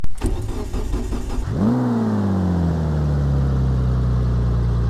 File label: start, rb26; crskautos